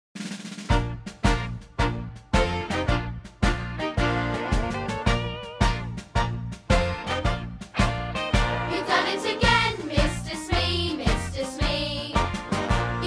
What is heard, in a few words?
Vocal